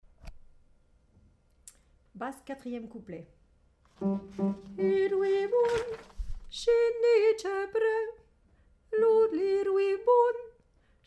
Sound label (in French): Basse